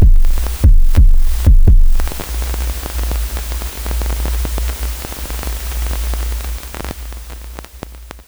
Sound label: Kicks Noise 145